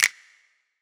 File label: Snap